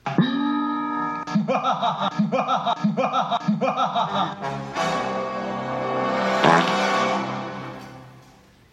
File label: dramatic ping